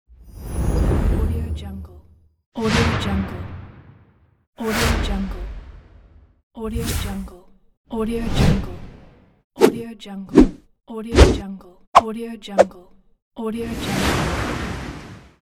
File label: whoosh